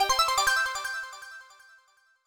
Badge Shop 99-Up, Sound effect; SMBW

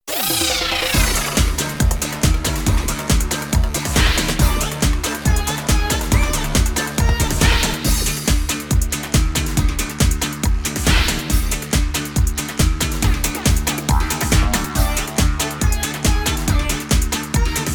Instrumental Theme; Snake Bananza

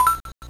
Coin SFX

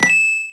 Coin; SSBM

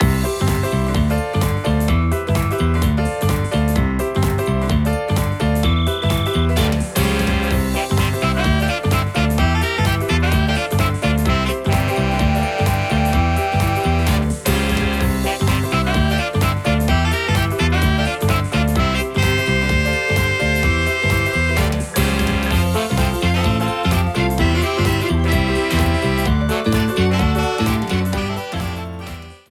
Battle; Paper Mario: Sticker Star

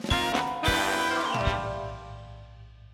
Quiz Correct

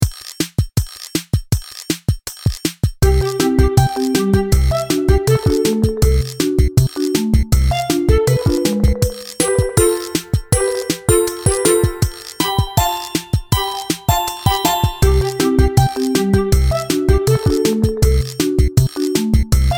Drawing Theme; NL YFC

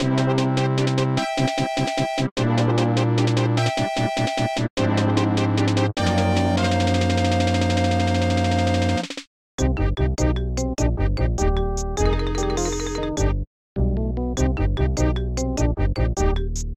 Finished Opening Level; MSP

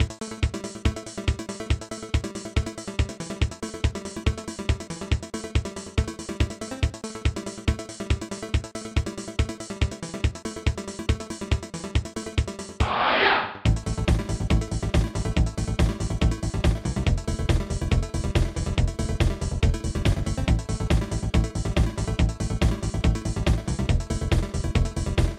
Fugue; DKC; SNES

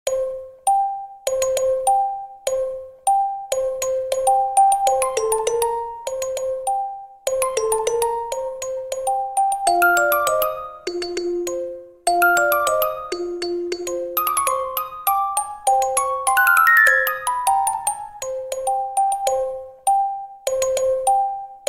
Underground Theme at night; SMM2; SMB3

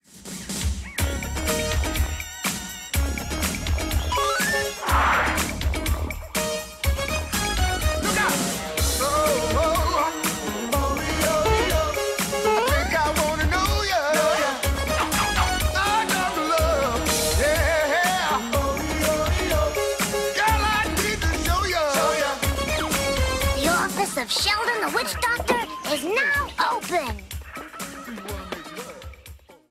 Short music sample of a cover song

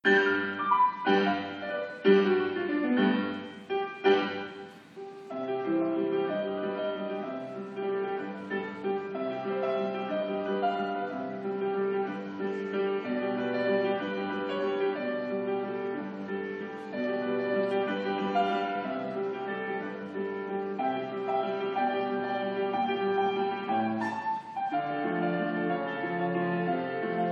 Piano Solo; SMB30thAL; Mario

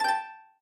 Player Death

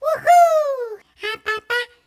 Baby Woohoo; Baby Mario; MG64

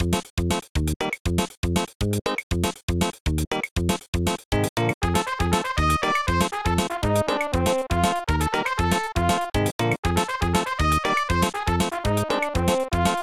Crescent Underwater

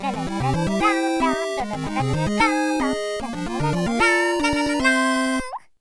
Course Clear; SMM

